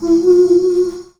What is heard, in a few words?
message sent instant